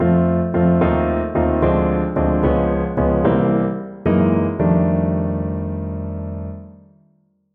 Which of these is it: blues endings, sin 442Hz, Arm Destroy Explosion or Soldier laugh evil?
blues endings